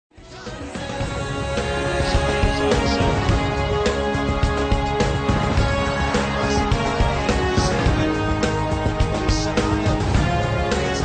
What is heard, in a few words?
pakistani anthem remix